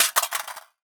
rifle magdrop; gmod; arccw uc commons attachments

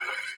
slide; gmod; arccw uc commons attachments

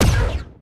shoot; gmod; kingpommes; starwars; atrt

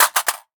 rifle magdrop; gmod; arccw uc commons attachments

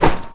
pipe lead light; melee weapons; tfa starwars melee weapons pack

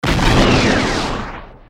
fire missile; gmod; lfs luna s flight school

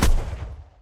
mg last shot; planes; gmod; lvs planes; weapons

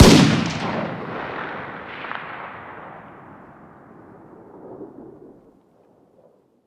fire dist 308 rif ext; gmod; arccw uc commons attachments

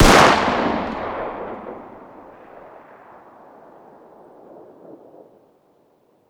fire dist 9x19 pistol ext; gmod; arccw uc commons attachments

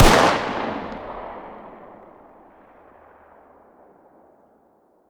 fire dist 9x19 smg ext; gmod; arccw uc commons attachments

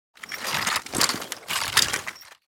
weapon pickup; weaponweights content

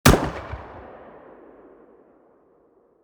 fire dist, 40mm; gmod; arccw uc commons attachments

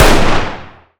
fire dist 300blk rif int; gmod; arccw uc commons attachments

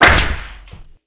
board damage light; hammer; melee; tfa starwars melee weapons pack

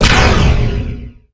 rocket; gmod; kingpommes; starwars; hailfire

